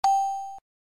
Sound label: win pot